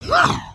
Run, creatures organic

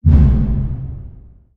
Pillar Shockwave; ambience

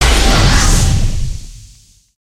Spear Throw; weapons

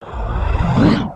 idle, creatures organic

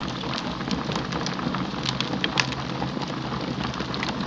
Torch; ambience

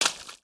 Foot Swamp Water Run; footsteps

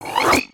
Tiki Torch Smile

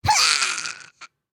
Strombie Die, creatures organic; Lego; aronwk collection; ndaudio

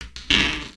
Torch Creak; Gnarled

